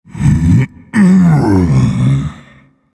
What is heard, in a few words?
Vocal Colossus Efforts Light